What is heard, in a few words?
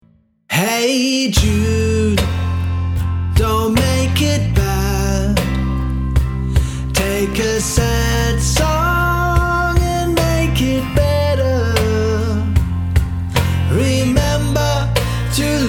Acoustic Trio